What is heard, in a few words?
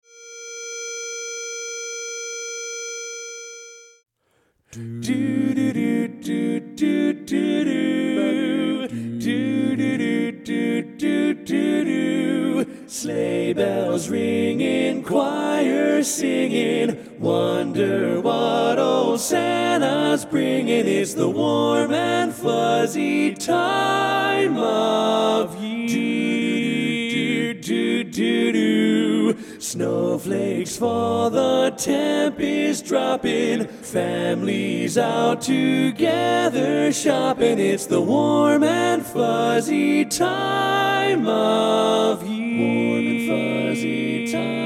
Lead Left; Christmas Music